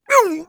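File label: Spy pain sharp, German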